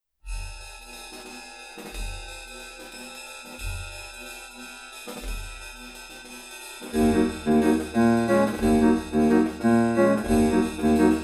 harmonic; Phase based harmonic percussive separation; reference algorithm

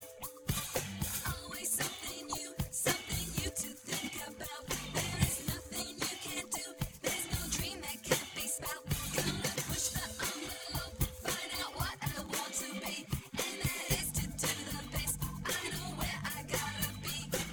percussive

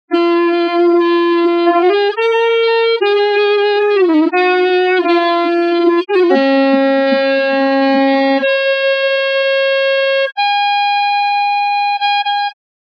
solo full

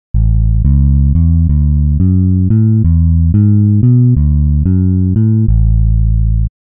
melody, bass synthesis; Finger-style (FS)

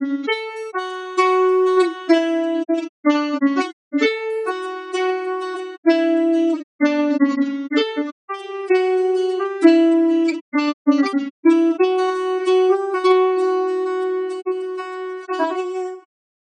solo

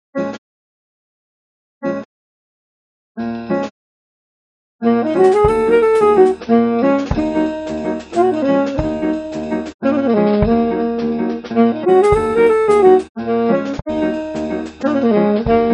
Noise solo

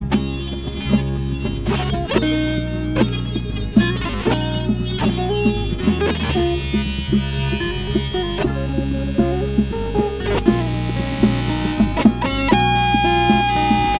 accompaniment full

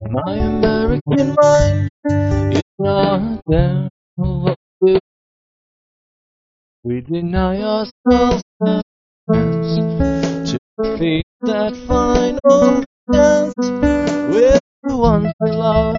solo

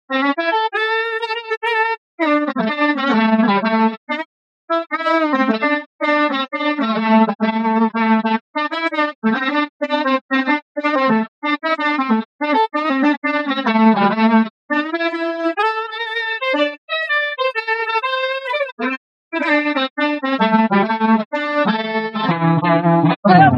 Free Music solo

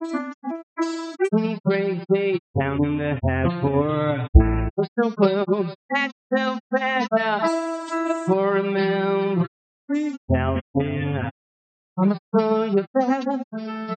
solo

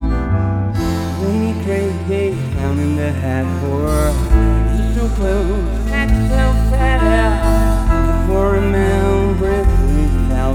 harmonic

